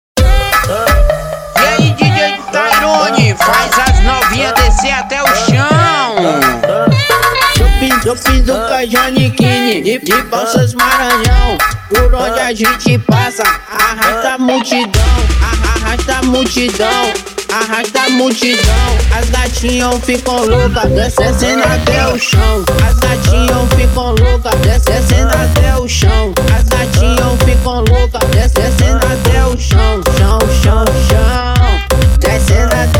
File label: funk remix